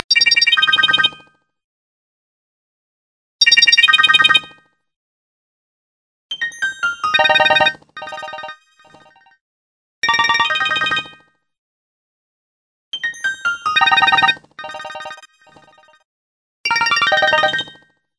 tin can, ringtone nokia

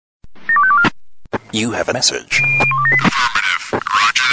police radio

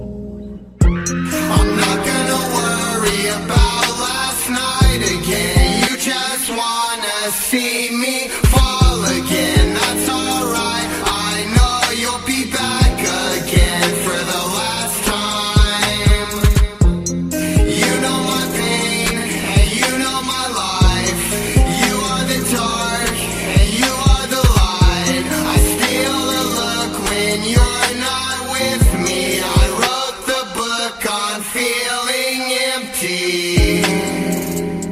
sad boy ringtone

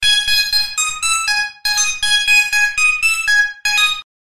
samsung galaxy msg

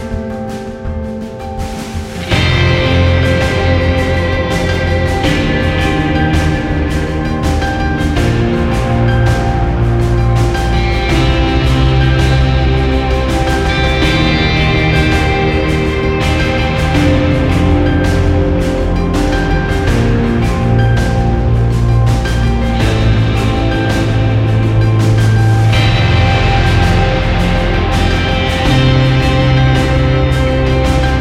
ringtone

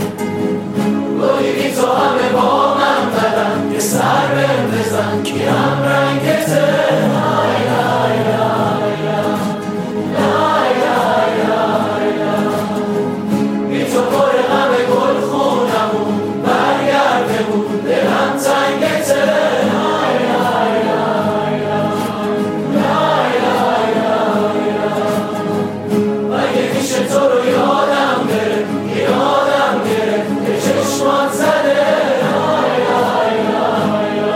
Guitar

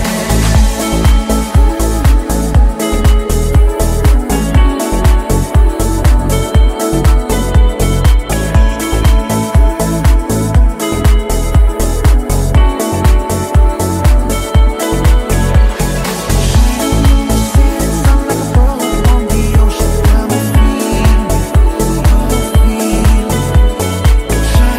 Deep House bikalam

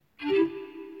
flute natural ringtone

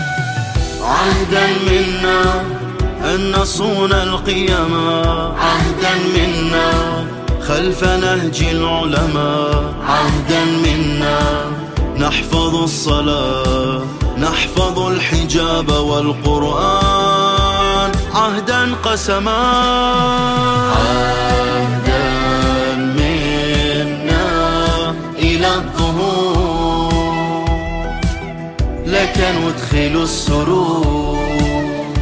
Arabic, ringtone religious

